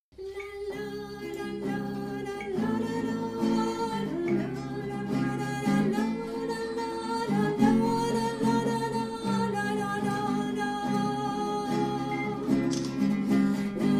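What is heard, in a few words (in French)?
Hallelujah, chœur; Tenor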